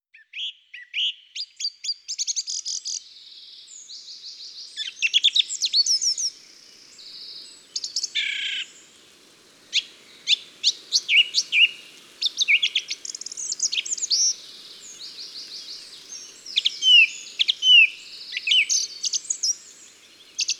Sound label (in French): Grive muscienne, Chants d oiseaux dans la campagne